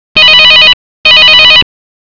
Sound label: ring